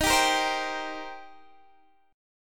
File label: D sharp 6th, D sharp, down, Guitar, DADGAD